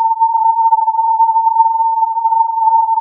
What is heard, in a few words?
filtered input